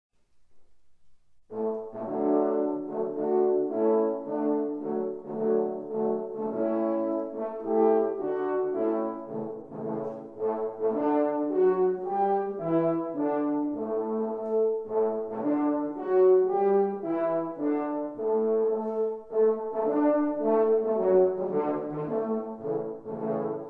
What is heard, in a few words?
Cosi fan tutte Marsch